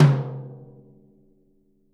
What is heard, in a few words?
Living Drums; Sampleheads; Peter Erskine